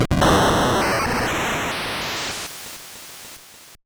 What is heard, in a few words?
finishing blow, attack sound; カタナ１